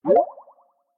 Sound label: message received